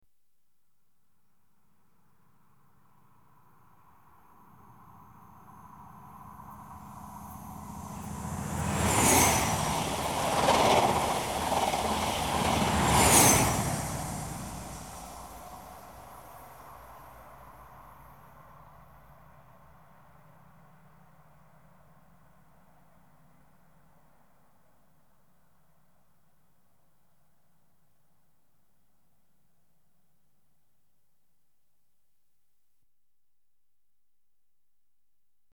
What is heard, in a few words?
Trains Passing Inter City 125; The BBC Sound Effects Library